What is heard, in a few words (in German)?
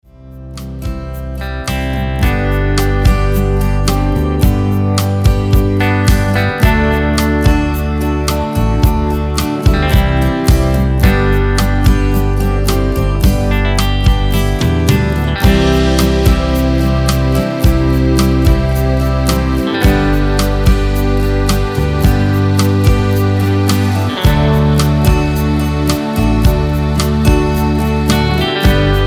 ohne Chor